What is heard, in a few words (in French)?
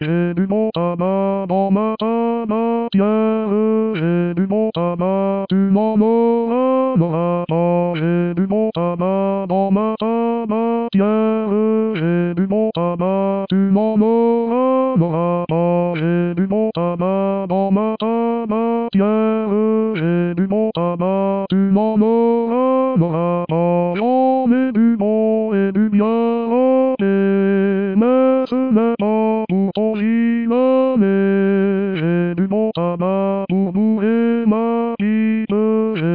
Baryton; J'ai du bon tabac